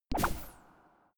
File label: space laser gun bubble shot; krieg-waffen; soundpool